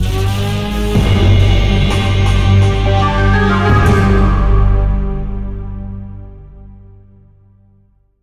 Game Over; Castle of Shikigami III; Arcade; Wii; Xbox 360

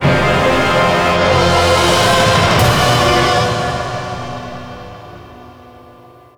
Music - Lose; Musicevilocity

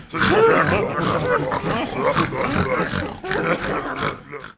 level failed piglets; Angry Birds Seasons

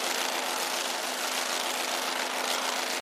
Propellerhat Aviator Loop; doodle jump 2; android

